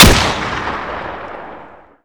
fn2000; Modern Warfare

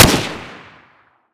g36; Modern Warfare

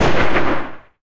12mm tails close, guns tanks; War Thunder

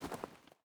footstep boots stones walk; Rust

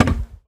Weapons Cannon Reload; DCS

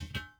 ui pickup metal bars; Rust

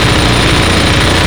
turret mgun loop far

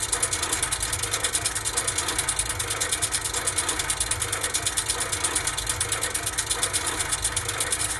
metal rattle

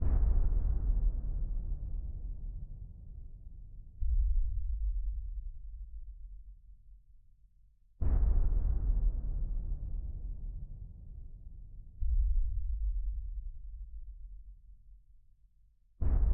music interactive; World of Tanks

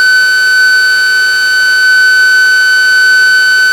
Aircrafts Cockpits Sidewinder High; DCS

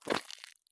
walk gravel; Escape from Tarkov

